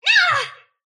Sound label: sylph pain; dota; dark willow